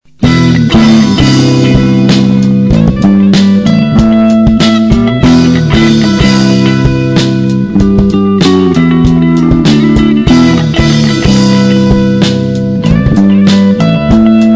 Funky Soul

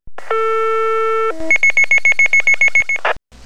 pager

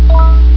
Medal

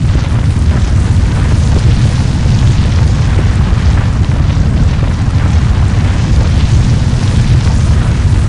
earthquake

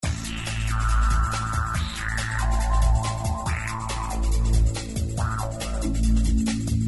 page soft

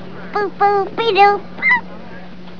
boop boop

